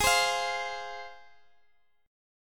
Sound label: G sharp 6th G sharp down Guitar DADGAD, chord